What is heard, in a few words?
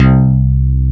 FM Syn Bass, Synth Bass, Roland